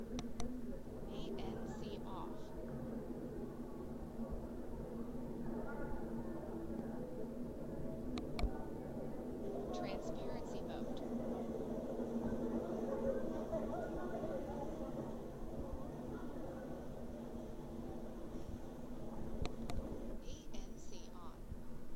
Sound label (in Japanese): ANC OFF 取り込み ON